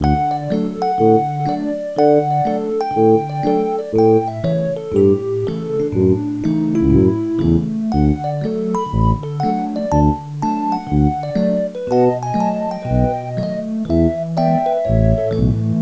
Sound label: Sound MUSIC SHORT; OFFICE